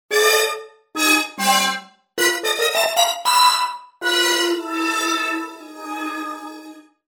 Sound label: Borg Horn; TURBO VL